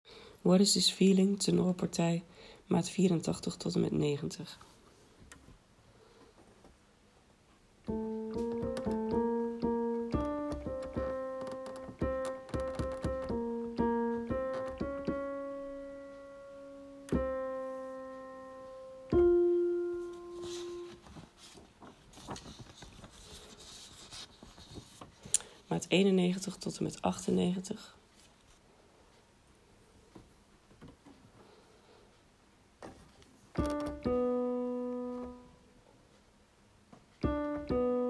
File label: Tenor